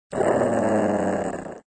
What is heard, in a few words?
Dog Warn; bobtherobber2